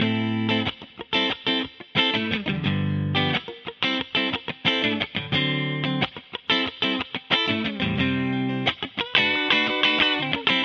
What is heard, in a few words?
guitar cepstrum mod